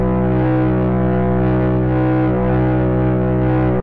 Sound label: plane; hedgewars; HedgewarsMobile